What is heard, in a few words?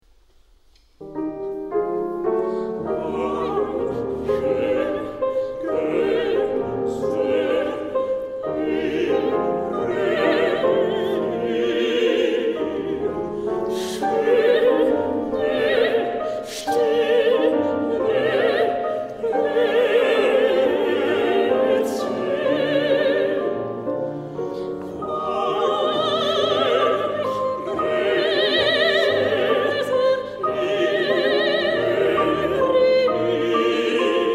Chorus; brahms; neueliebeslieder